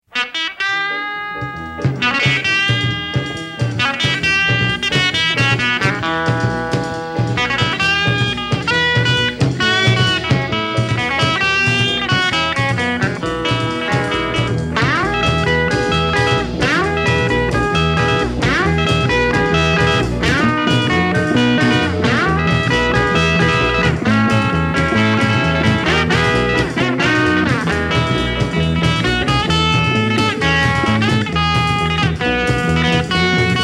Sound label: Guitar Rag